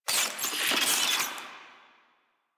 Infested Ashen Vocal Attack Death; Warframe

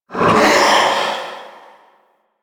Reaper Vocal Jump; Warframe; Wraith

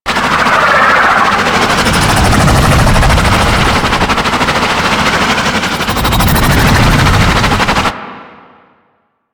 Hub Dist Loop, Ambience; Liset; Warframe

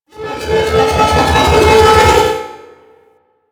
Head Track Zoom Out; Simaris; Warframe; Ambience; Hub

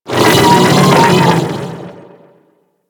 Vocal Attack; Amalgam Override; Warframe

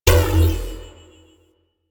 sphere small power on; ambience; Warframe; Entrati

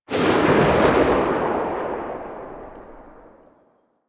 Sniper Alt Fire Tail; DE; Warframe; Frumentarius; Exalted Sniper

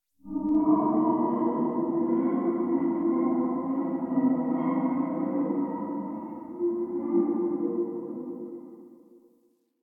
Exterior Distant Calls Scared, Ambience; Warframe; Duviri

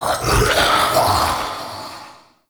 Mockery Male Vocal Attack; Characters; Enemy; Vocals; Mockeries

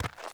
Walk Gravel, Footsteps; Characters; Enemy; Mockeries

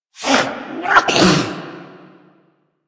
Pet Sneeze; Enemies; Warframe; Catbrow; PetShip

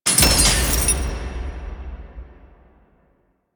Chain Break C; Warframe; Harrow; Red Veil Temple

